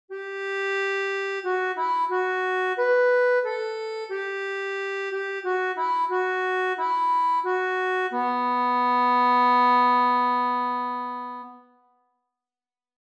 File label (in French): ACCORDEON